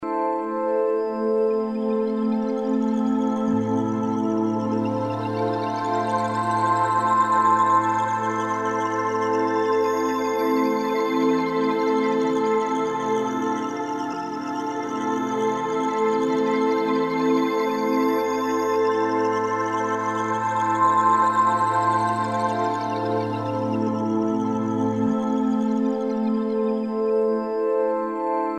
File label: tinnitus therapy 1k 10k; sound oasis